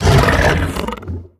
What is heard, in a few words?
idle in, barnacle; Half Life Alyx